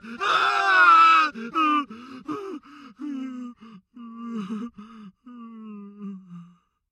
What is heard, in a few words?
death; freak fortress 2; scp096